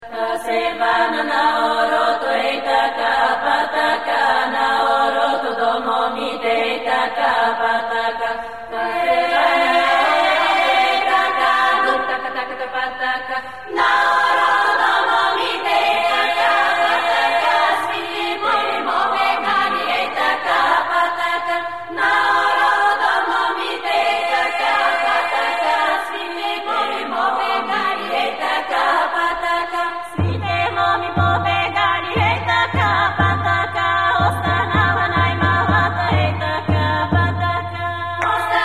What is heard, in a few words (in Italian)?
Voci Bulgare, Esempio ritmico e armonico; Cori Polifonici; guida ascolto